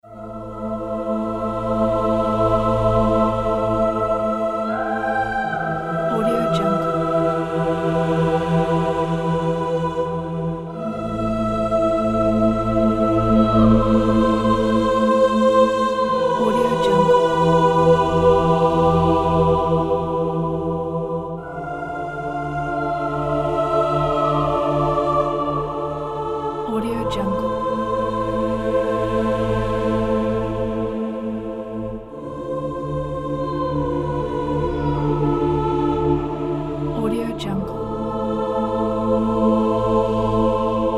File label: church choir